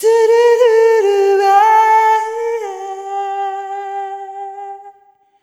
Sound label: Arrhythmic G